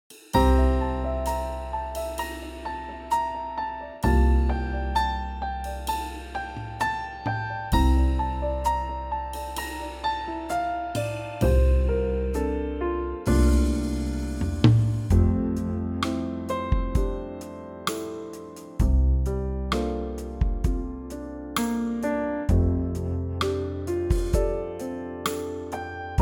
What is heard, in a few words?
F Trio